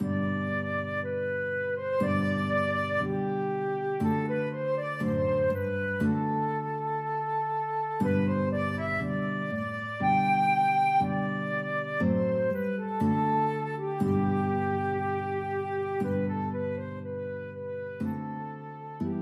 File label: flute guitar duet